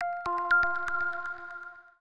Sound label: alert